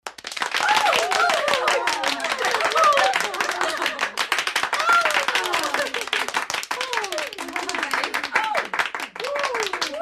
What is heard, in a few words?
10 Women Clap Oohs